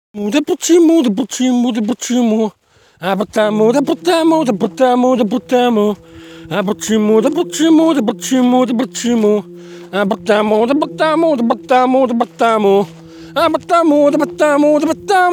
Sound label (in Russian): спонтан. напев ''а почему? да потому!''; депрессия сгниёт, а душа будет жить вечно